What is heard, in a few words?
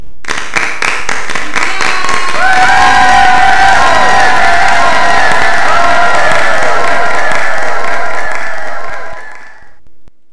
Yay